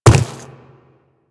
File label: Quadruped Medium Walk Dirt, Footsteps